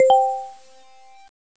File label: correct